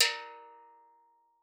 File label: A#3, STEELDRUMS; Xsample VOL-4